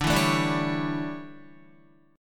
D Major 7th Suspended 2nd Suspended 4th D down Guitar 4F 4A 5C 5G 5C 6E; DM7sus2sus4; strummed; JGuitar